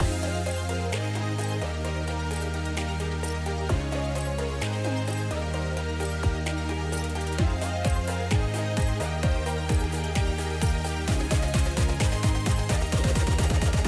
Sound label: Battle Hero; code shifter